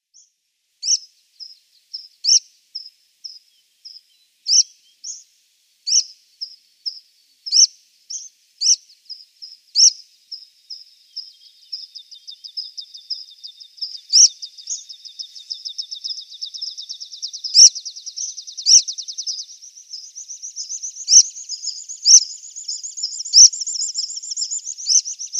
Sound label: Water Pipit calls